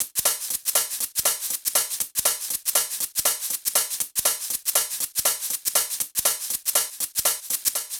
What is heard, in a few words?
Hh Sh Loop 120; Equipped Music; Loungin House